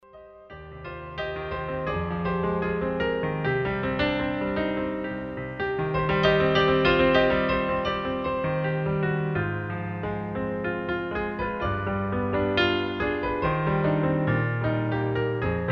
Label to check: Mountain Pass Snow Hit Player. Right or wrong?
Wrong